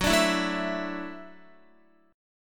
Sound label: Gb Minor 7th Sharp 5th, Guitar; strummed; JGuitar